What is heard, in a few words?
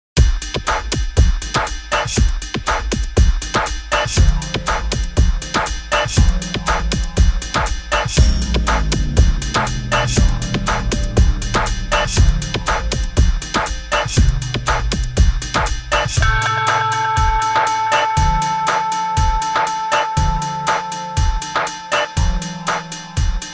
abandoned factory